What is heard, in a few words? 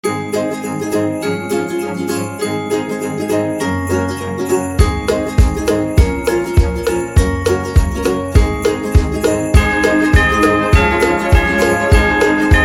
Amazing Christmas